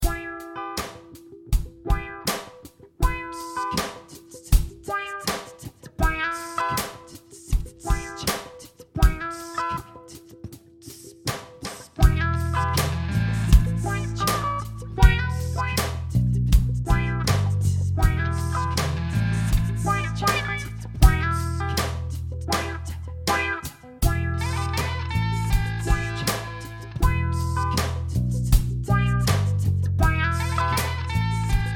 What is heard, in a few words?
test groove